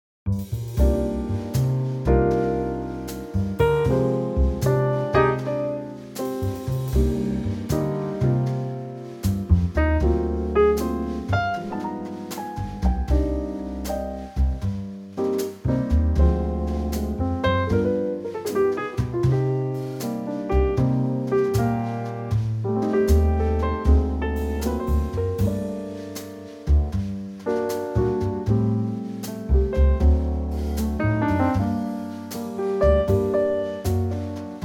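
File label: C minor trio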